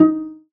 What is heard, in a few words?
D#3, Cello Pizzicato; Orchestral; DirectWave; Varazdin Orchestral